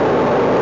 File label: ship; soloassault